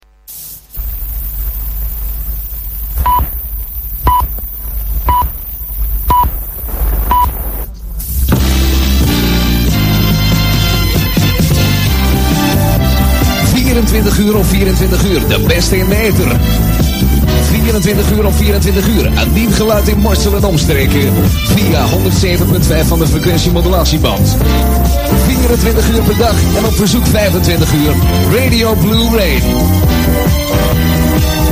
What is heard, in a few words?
jingles; Blue Rain